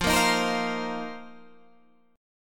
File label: Bb Suspended 2nd Suspended 4th F